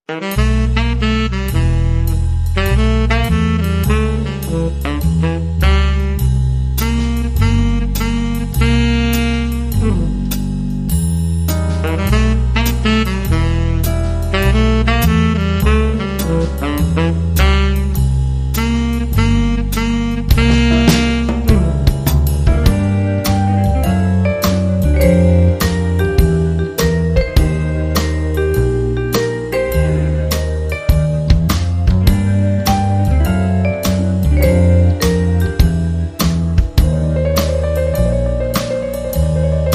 Mystery Horn

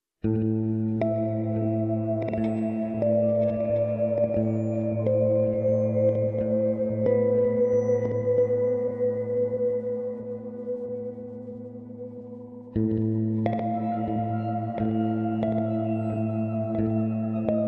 Diminution

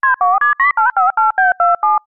Wavering Dialing Beeps

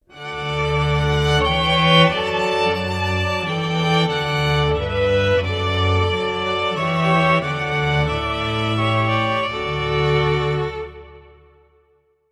Strings Sting

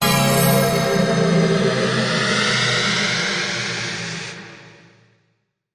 Galaxy Tone

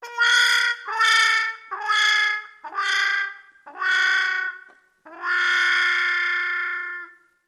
Trumpet Slow Mute Wah Wah With Growl

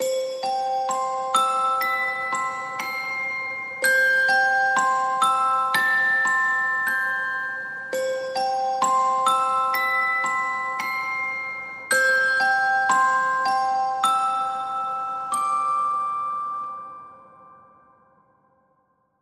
Toyland Childs Wish Atmos

